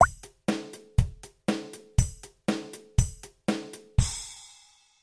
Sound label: Drums